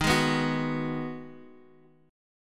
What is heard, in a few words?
G sharp Minor 7th D sharp